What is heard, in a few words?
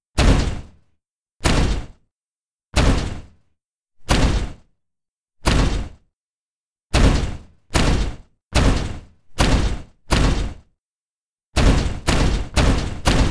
Heavy Robot Footstep Sounds Various Speeds